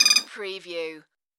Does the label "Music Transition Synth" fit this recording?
No